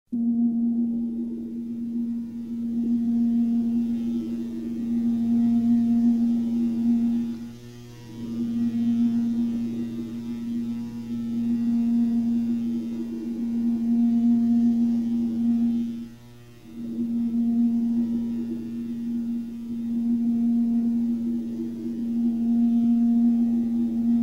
forcefield; background; TOS

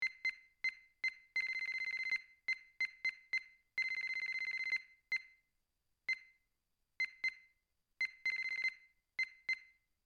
helm beep sequence, computer

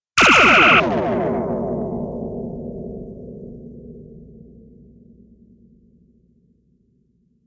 phaser ricochet

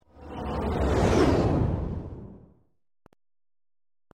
warp out

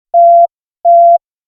communicator beep; TOS

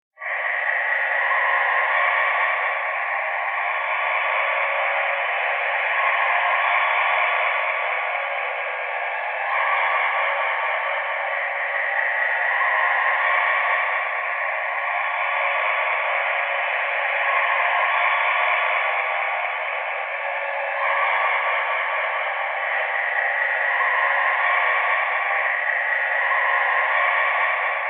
planet, background; TOS; Vaal

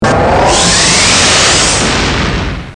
warp

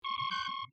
alert, computer

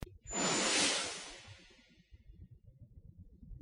flash

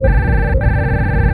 ambient bridge, computer

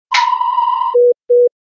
hailing frequencies open

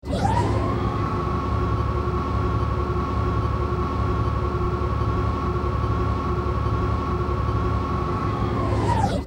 turbolift

